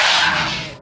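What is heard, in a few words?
MON INSECT ATTACK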